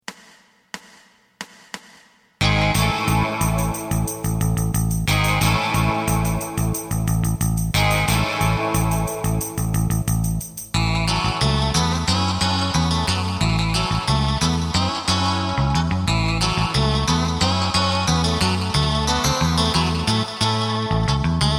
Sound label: drum